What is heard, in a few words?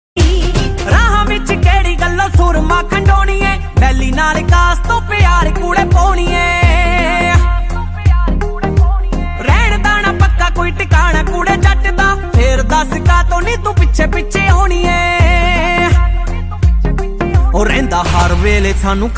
new song ringtone panjabi song